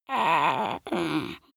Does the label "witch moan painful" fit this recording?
Yes